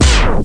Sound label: Shield; Monkey Shines 1.2.1